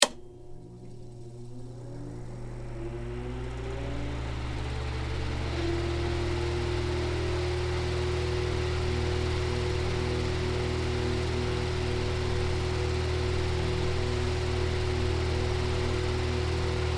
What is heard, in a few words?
Fan On Run Off